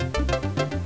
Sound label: Short Up